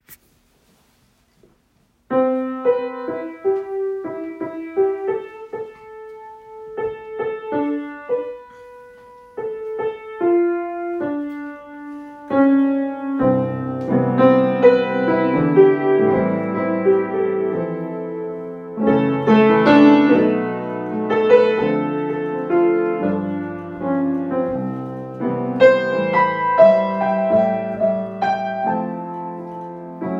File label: Pitch Outs Improv